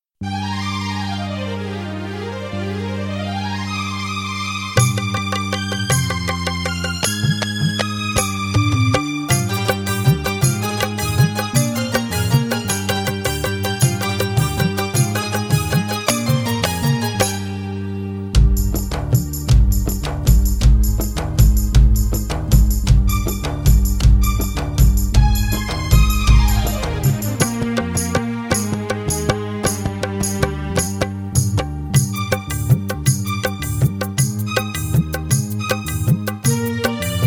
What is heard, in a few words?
karaoke